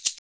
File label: SHAKER, Modern Percussion Loops; Soundscan vol.56; AKAI